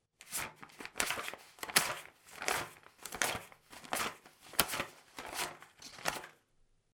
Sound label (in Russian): zvuk deneg